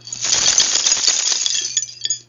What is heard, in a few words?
Shell Bra with Bells and Coins